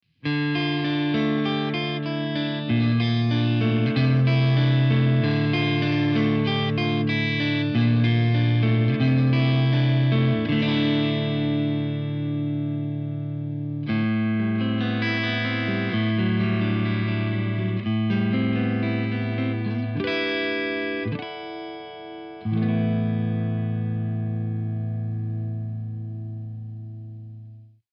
CLEAN SOUND; Nenbrini; Divided 11; Class A

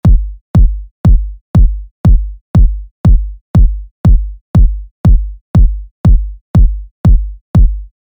Transient DRY; United Plugins; Dropkick

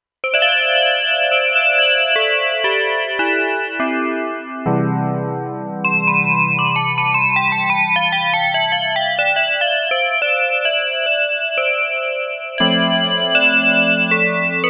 Bank BELL Patch CHIM; Sonicware; Liven XFM

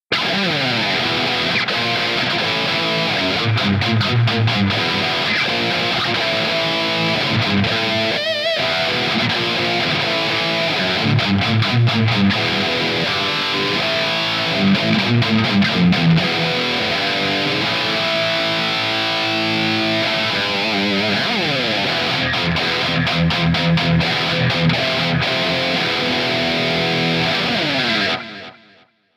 Hi Gain Sound; Omnyss; Captain Mark

